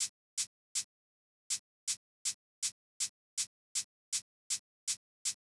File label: Shaker; big fish audio; Hip Hop Exotica; Apple Loops